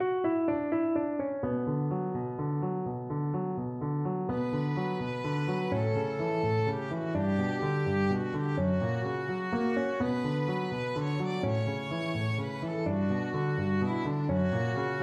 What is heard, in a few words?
spanish violin